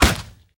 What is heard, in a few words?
fire impact; hades; smite